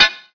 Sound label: Glass Tk; Added debris to the explosions of flares and voice boxes.